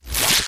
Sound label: imp bullet energy bubbleshield; Titanfall 2